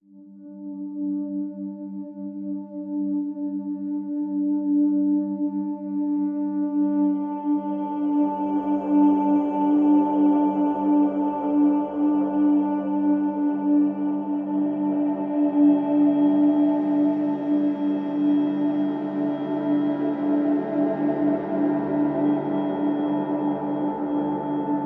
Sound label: Pads Only